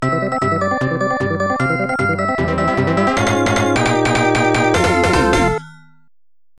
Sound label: Challenging Stage Perfect Music